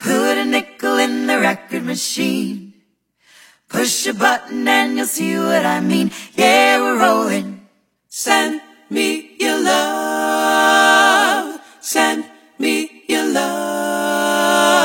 Vocals; Output Arcade Jukebox

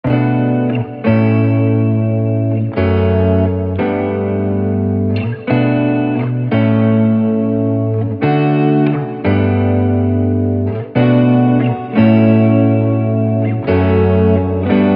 Music Production Software; Arcade

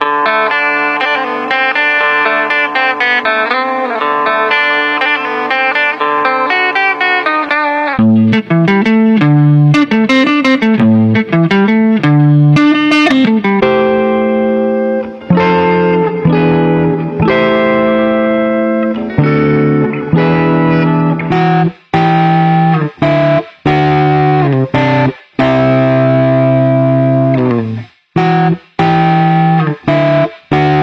Vintage Guitar; Dabro Music Samples

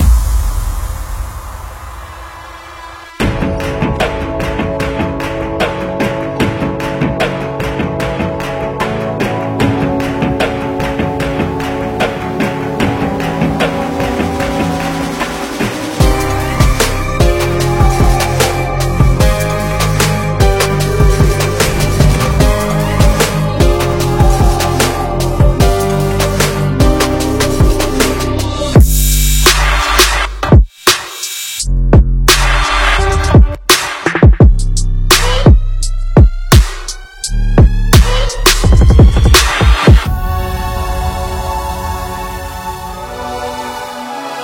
Sample Pack; Splice Sounds

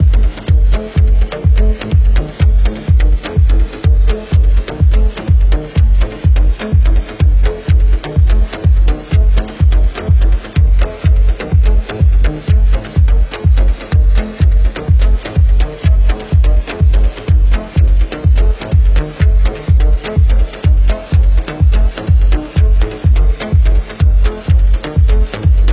Synth Loop Gbm 125bpm

